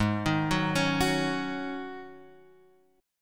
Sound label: G sharp 7th Suspended 4th G sharp, Guitar Half Step Down